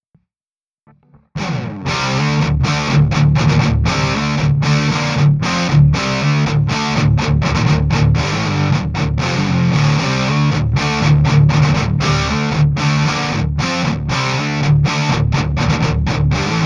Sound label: 6505 Penta Tracked; Real Amps